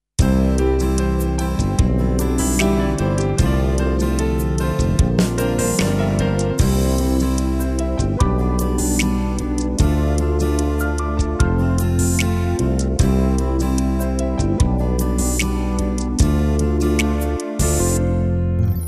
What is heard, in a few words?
Pop Ballad, Tyros2 styles